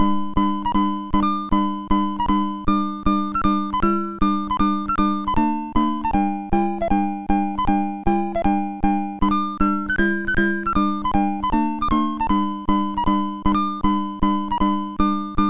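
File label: Maxi Golf, MOS 6581 SID chip music, games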